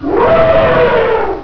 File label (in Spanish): elefante